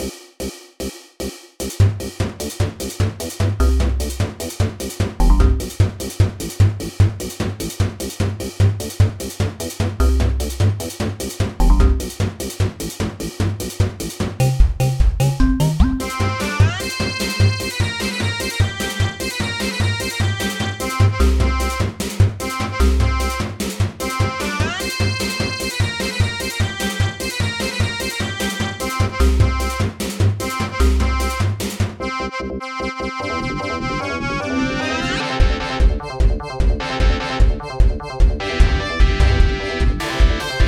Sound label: accordion guitar action; MIDI loops